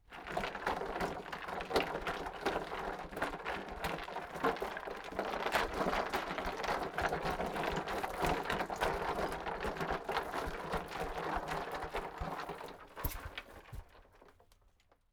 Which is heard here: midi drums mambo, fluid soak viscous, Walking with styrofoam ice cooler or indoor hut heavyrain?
Walking with styrofoam ice cooler